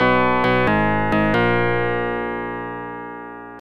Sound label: Unknown Jingle